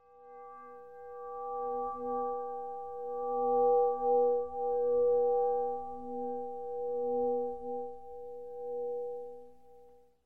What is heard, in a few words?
bells, Rumbles